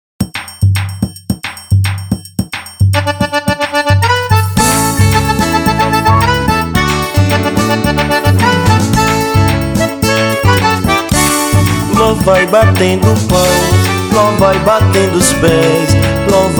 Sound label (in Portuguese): louvai batendo palmas